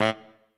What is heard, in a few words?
Trumpet melo